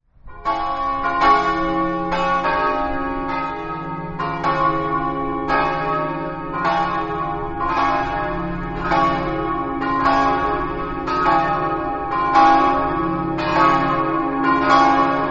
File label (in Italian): basaldella, campane